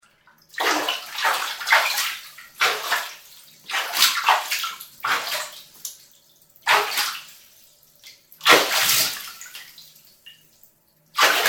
お風呂のお湯で遊んでる音

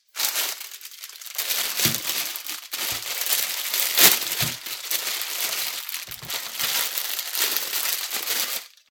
ピーマンの袋を開けて、まな板に置く音

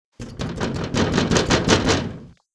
教室の扉を叩く音; door

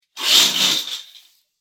レースカーテンを開ける音; curtain

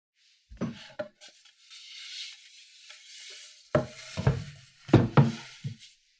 まな板を置く音

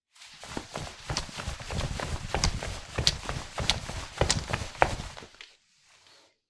フローリングを歩く

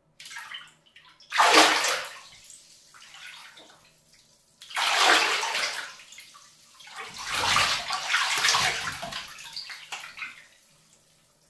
お風呂のお湯で遊ぶ 大きい音バシャ音

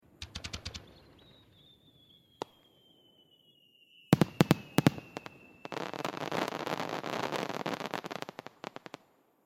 花火の音 連射; firework

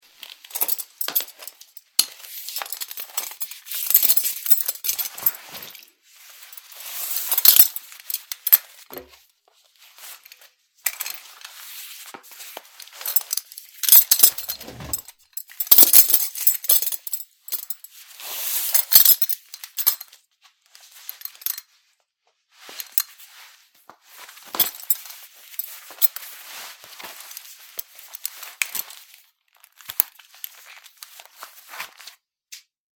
ベルトをつける音

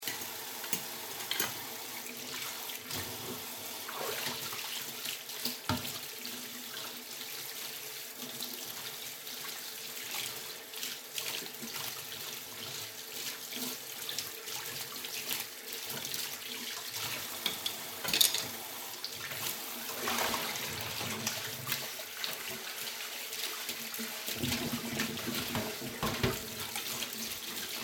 洗い物をしてる音

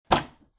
壁のスイッチを押す音; switch

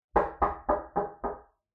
扉をノックされる音

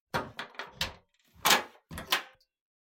トイレの鍵を閉める音; toilet

